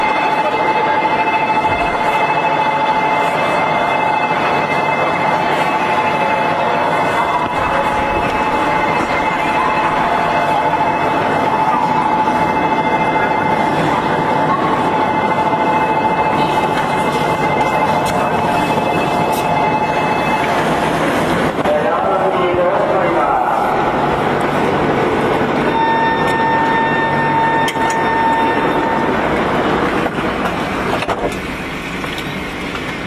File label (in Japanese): 新幹線 ベル